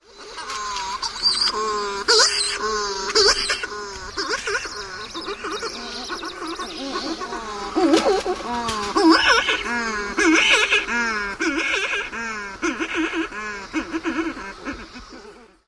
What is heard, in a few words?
calls from burrows with chicks